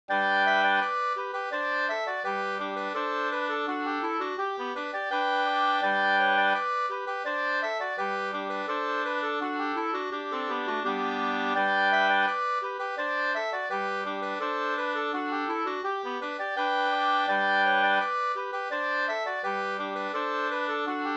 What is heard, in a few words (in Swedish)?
ganglat; Kyrkmarsch; Södra Kinds härad, Västergötland, upptecknad av Albert Löfgren; med andrastämma av Nils Löfgren